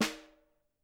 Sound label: SN, Percussion